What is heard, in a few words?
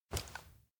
can juice receive; awayuki; anemoi